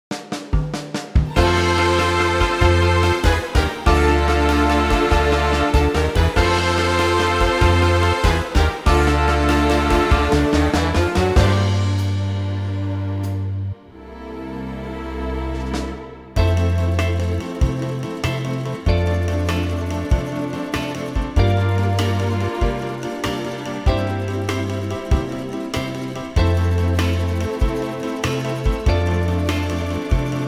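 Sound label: F, backing track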